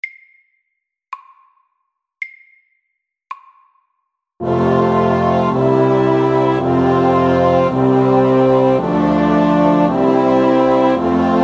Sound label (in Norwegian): Kromatisk koral MIDDELS